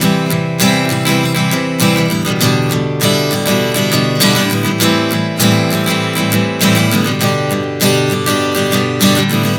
Progression 100 C-G-Am7-G, Acoustic Guitar, Chord Progressions 100 bpm